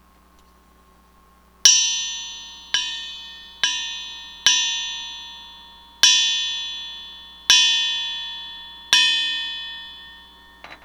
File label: new Tone Ring, banjo